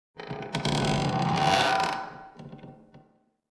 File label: wood creak; mines